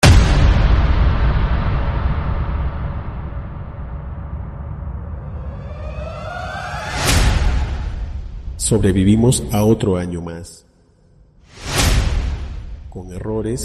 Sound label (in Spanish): Open Show Año Nuevo con voz